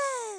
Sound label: boo